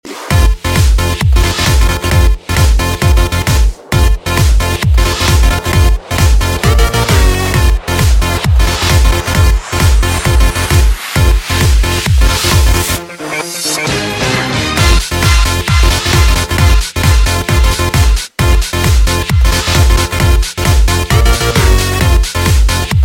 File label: Hawaii Bass